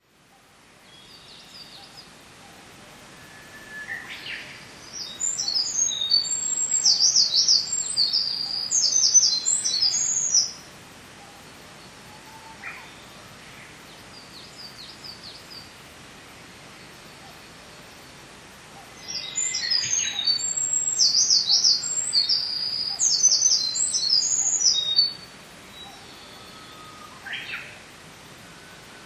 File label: wren